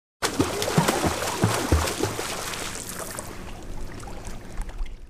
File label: swimming sound effect swimming noises